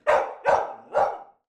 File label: animal dog labrador barking internal